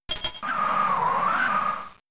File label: Icy Wind; Pokemon; Gen 3; Attack Moves; RSE; FR LG